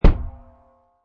Cartoon Rubber Hit, Dull thud impact with afterzing; Comedy/Cartoon